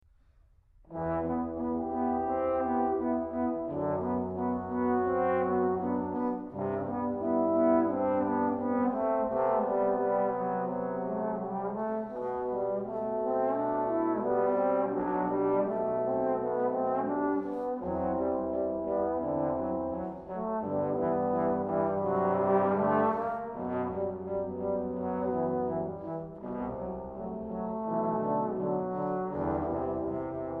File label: preludes and fugues for piano